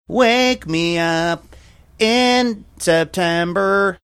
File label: singing